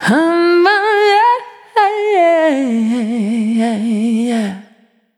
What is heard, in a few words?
Out There, Vocal Samples, Single Voice Melodic Loops; Singles